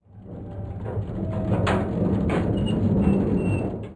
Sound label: Wood Rolling